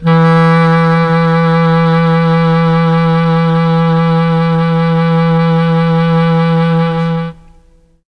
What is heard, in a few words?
E2 clarinet, mellotron; CHM CLARINET